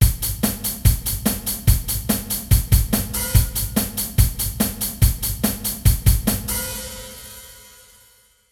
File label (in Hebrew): הוספת מצילת הייאט; בניית מקצבים